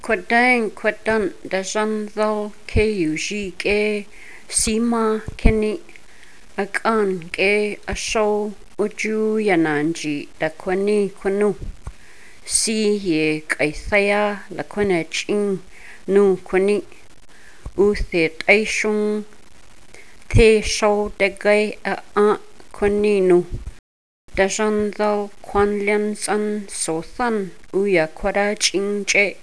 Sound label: golden horn